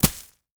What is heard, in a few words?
Weather Snow Dropping On Snow